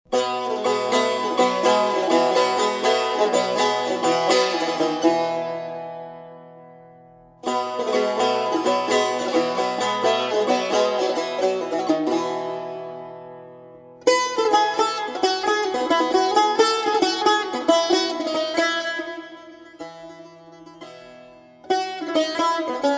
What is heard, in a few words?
Saz